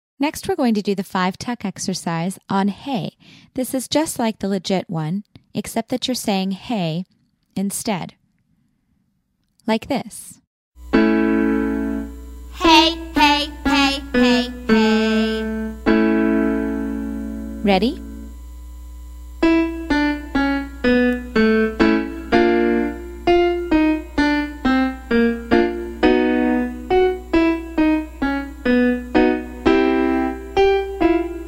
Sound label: Hey 5 Tuck